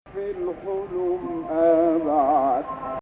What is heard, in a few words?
Rast, maqam